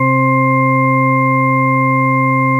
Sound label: ORGAN; GMPlay; GMTones